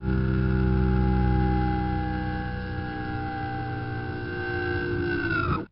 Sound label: Gear up, Helicopter K52